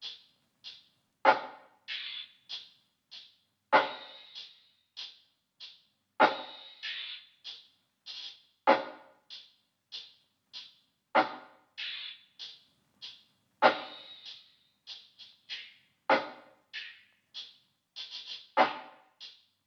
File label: percussion, 97 BPM G Min; Desires